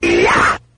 "eeeyah", voice; ooh ahh

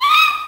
dog yelp; ooh ahh; ow ouch

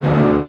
bold, String

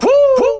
"woo", male

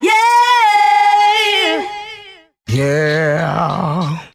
"yeah" sung

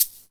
Shaker

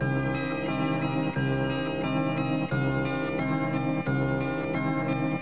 keys, Loops, Sad

